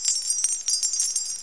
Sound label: freeze; Duke Nukem 3D Kill-A-Ton Collection